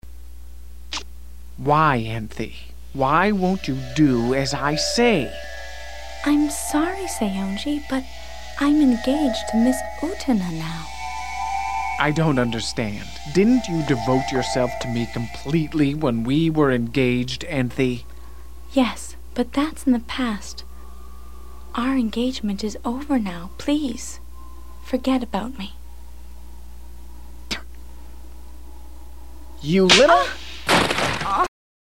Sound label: slap; utena